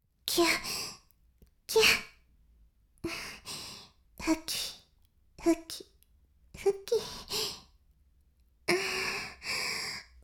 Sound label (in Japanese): しこしこ音声, ASMR; 白くて黒い魔性円環